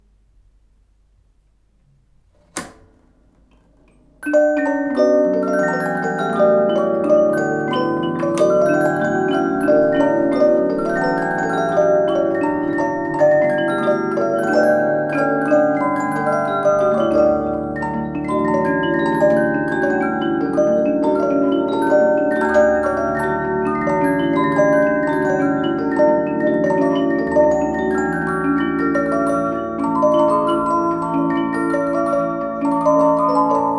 zenedoboz hengeres 12 dallamu; verkli hang muzeum; 1865 svajc

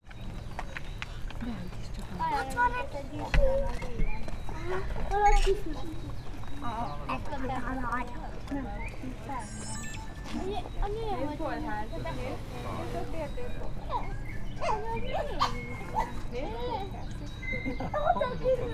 inkább a látogatók, dzseladapávián; allathangok; veszpremizoo 2013; akg

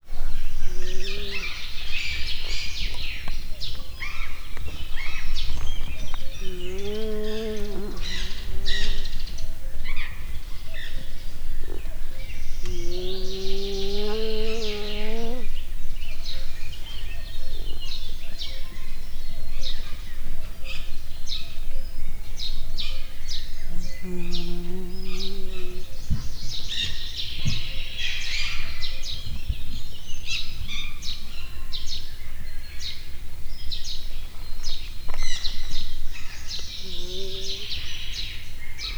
halkan nyoszorog, sisakoskauzar

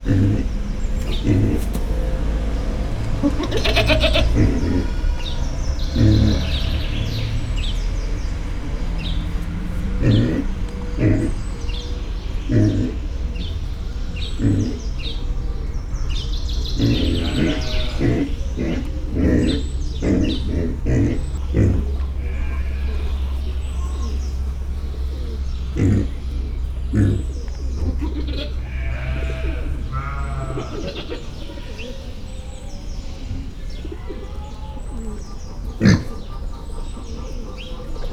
mangalica kecske; parasztudvar; szegedi zoo 2011

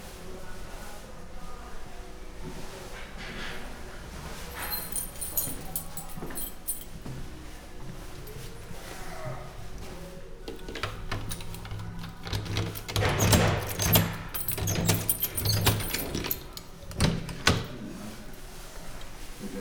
megérkezés a lakásba, ajtó; Angyalföld

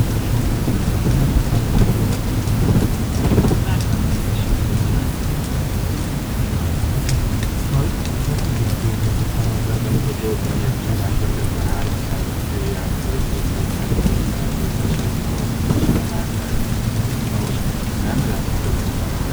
barlang néhányuk beszéd, denevérek; repules; termeszetben

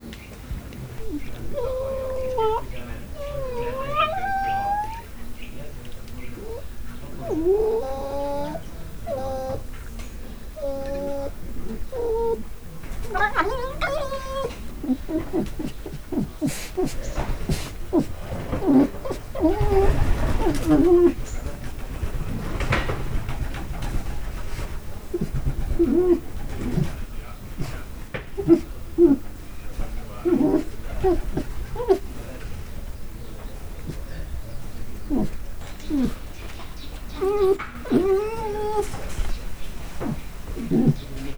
monolog hatterben dolgozo emberek, vorosroka; állathangok; miskolci zoo 2009

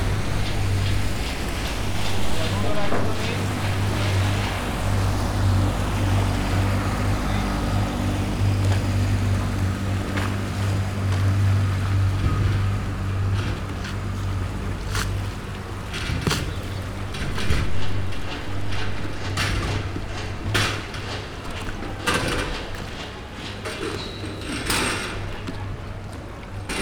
keskeny csatorna, balról motorcsónak, Velence, laguna; olaszorszag velence 2014

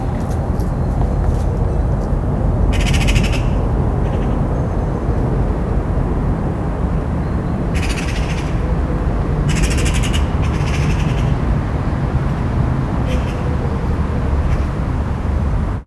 városban csörög, szarka; termeszetben; allathangok

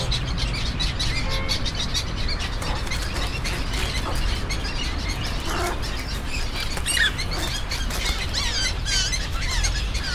pásztorgém, Arrecife; allathangok

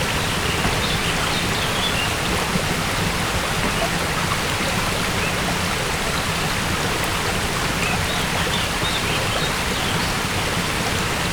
ernyo alol, gorogtaj thassos; Potamia

